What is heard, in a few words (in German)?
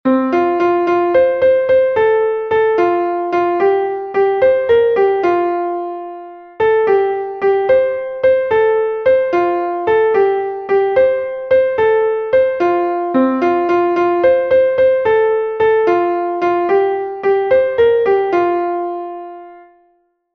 klavier; MelodieMeister